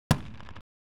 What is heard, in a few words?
fight